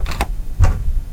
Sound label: door open